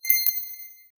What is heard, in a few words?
create ui and menu sound